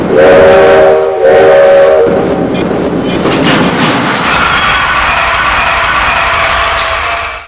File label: steam train; svencoop; hunger; thambs